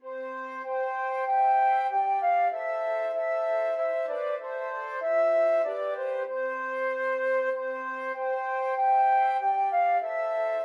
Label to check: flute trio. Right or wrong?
Right